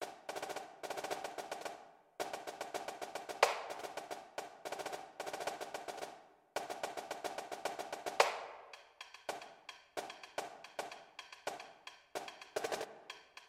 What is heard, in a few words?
snare tryout